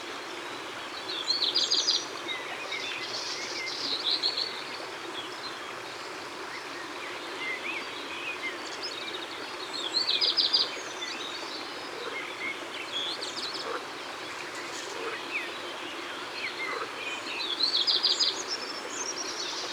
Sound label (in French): Rougequeue Noir, Ardeche; Concerts Naturels De l'Ardeche